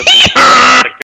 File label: Hee Haw